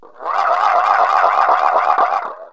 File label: whirling, cartoon